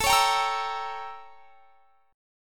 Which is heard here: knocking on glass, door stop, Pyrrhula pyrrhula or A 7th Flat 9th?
A 7th Flat 9th